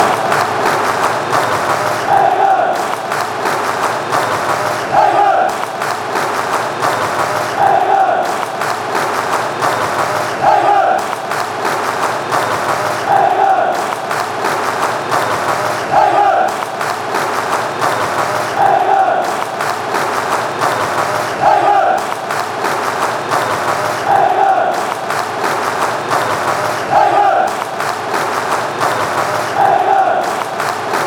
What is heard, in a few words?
england clap